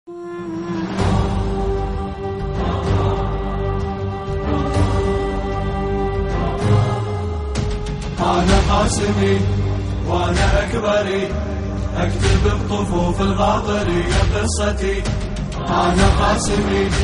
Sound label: nawha arabic